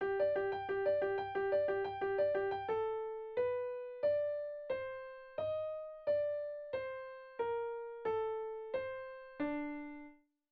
Figura di accompagnamento per pianoforte

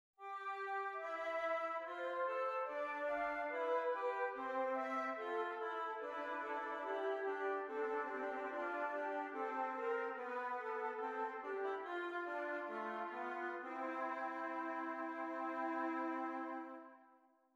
esercizi di doppio contrappunto nella decima

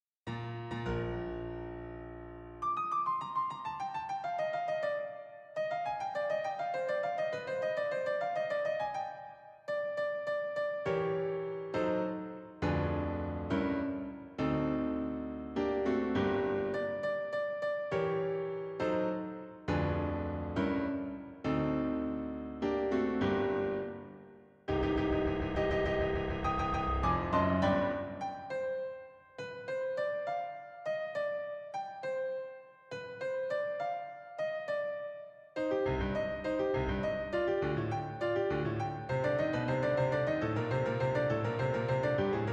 Abbozzi per un primo movimento di sonata per pianoforte

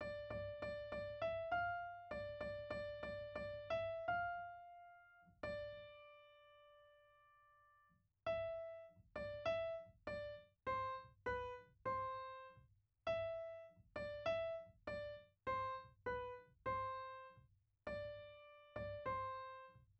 Melodia studio

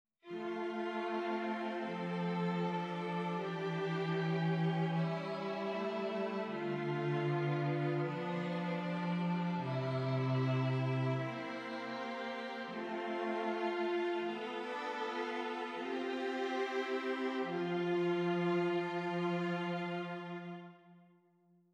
esercizi di contrappunto semplice A tre voci

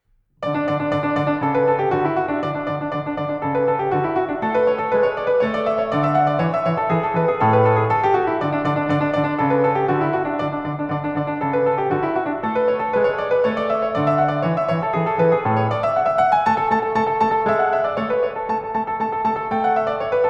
Anglaise in re maggior pianoforte

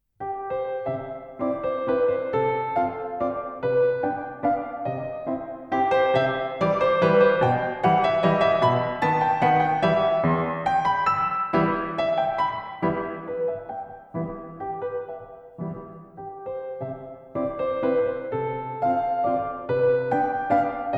Valzer pianoforte; von Kapellmeister Michael Umlauf

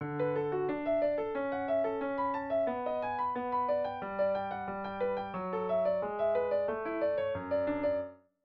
Abbozzi per pianoforte

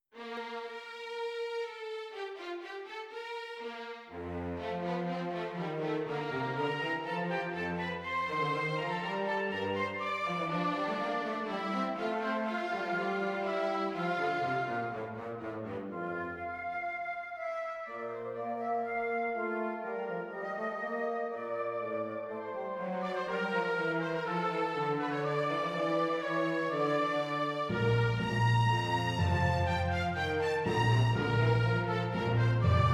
Orchestrazione; Ronge; Gerd Prengel

